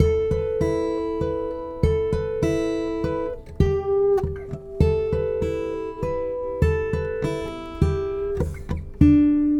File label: Red Trees Gtr, Guitar Only; Sonic Foundry (Sony Creative Software); Friendly Fire